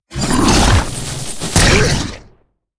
Boss Mech Turtle, monster

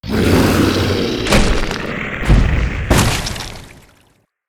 death, monster; lake

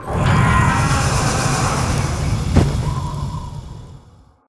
reaper boss death, monster

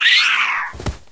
chimpanzee dead

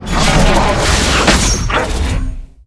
attack

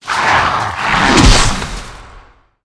dark phalanx attack

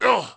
night fighter damage, monster

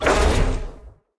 damage, monster

